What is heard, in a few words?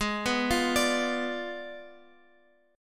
G sharp Diminished 7th G sharp, Guitar Open F; G#dim7 arpeggiated; x,x,8,6,8,9